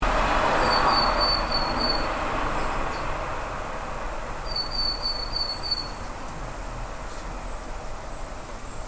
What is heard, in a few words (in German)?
Weidenmeise